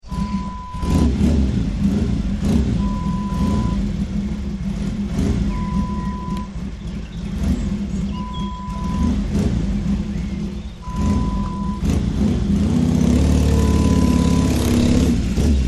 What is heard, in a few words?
Classic Motorcycle